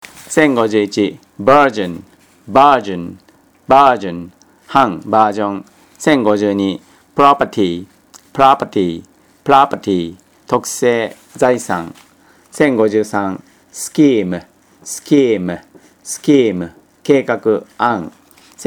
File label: tango